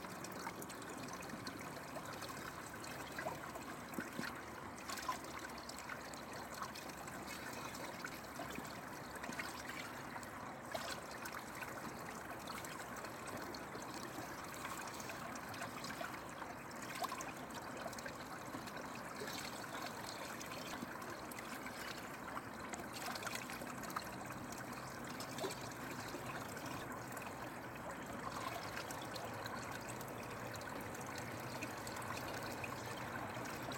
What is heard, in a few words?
rill sound